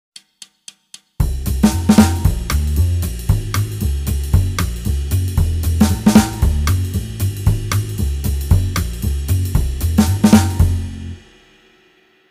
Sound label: Jazz backing, Guitar; Guitar Techniques 2018 Issue 278 February